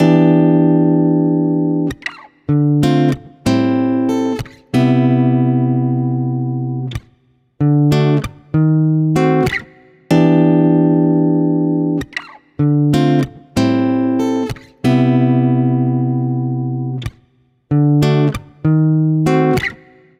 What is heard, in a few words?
Guitar 95 BPM (Emaj); Guitar Loops; Ever; Ghosthack; Guitar Loops & Shots